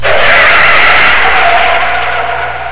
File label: car screech; Effetti sonori